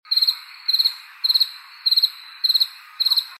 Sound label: crickets